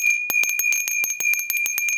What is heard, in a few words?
bell